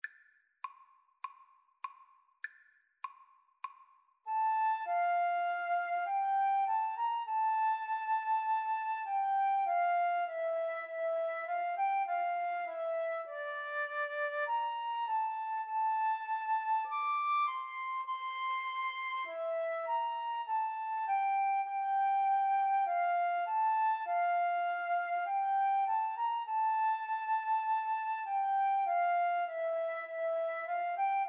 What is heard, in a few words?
Flute Duet